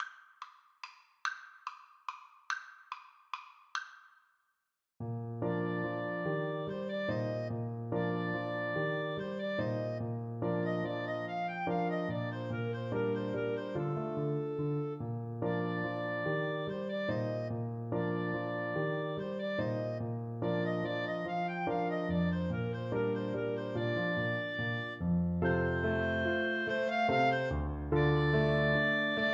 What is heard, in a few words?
Clarinet Duet